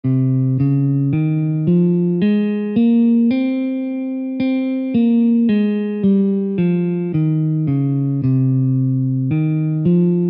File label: scale; scales of India